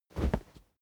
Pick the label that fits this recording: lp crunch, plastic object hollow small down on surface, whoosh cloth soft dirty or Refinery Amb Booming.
whoosh cloth soft dirty